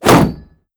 shield light bash; Skyrim Special Edition

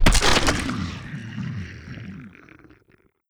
draugr death; Skyrim Special Edition; npc

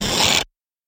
chest open; pond5

